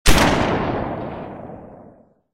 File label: Weapon Gun; puzzle-quest